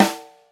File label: snare drum g key; snare drum samples; drum single hits one shots